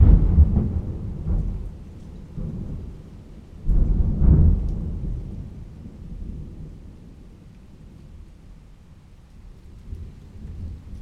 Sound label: Deep Thunder Clap